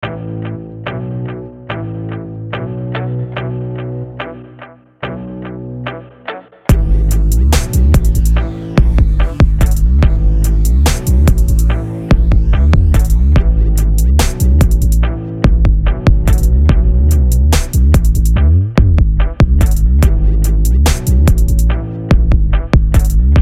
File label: INSTRUMENTAL BEAT